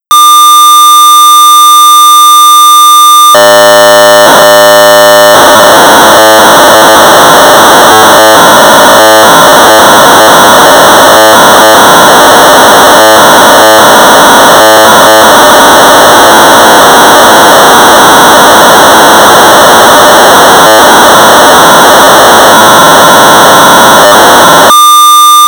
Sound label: BASIC Sorcerer 1200baud; Flash Card; Practical Computing Oct pg.74